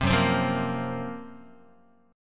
F sharp Major 7th Flat 5th F sharp, Guitar; 3,2,3,3,2,x; 4A,4E,5A,5D,5Gsharp,6Csharp; strummed

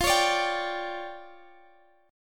D Minor Major 7th Double Flat 5th, F

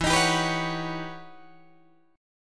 E Minor Major 13th E Guitar DADGAD

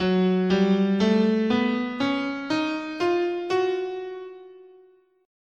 F sharp Neapolitan Major, scale

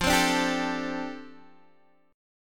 F 6th Add 9th F Guitar Open G

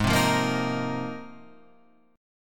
Ab 6th Add 9th Ab, Guitar, Open F; Ab6add9 strummed

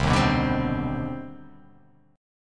C Minor Major 9th C

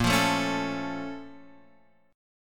A sharp Minor Major 7th, down; A#mM7 strummed; JGuitar

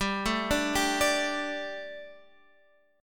D Suspended 4th G

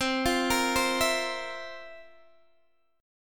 F Major 7th Suspended 4th

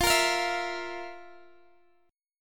F Major 7th Suspended 4th, Guitar, DADGAD

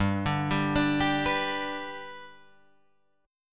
G Major G down Guitar Drop D

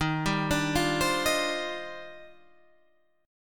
D sharp Major 13th, down, Guitar, Standard; D#M13 arpeggiated

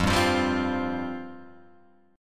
F Suspended 2nd F down Guitar Open G

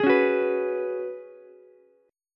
F sharp Suspended 2nd Suspended 4th, Ukulele; strummed; JGuitar

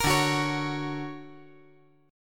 D Augmented Major 7th down

